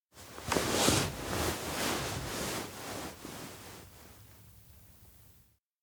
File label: shake body wake; anemoi